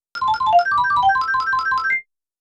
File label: final level bonus; mixkit